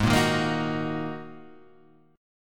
Ab 7th Suspended 2nd, down, Guitar, Half Step Down; Ab7sus2; chordshape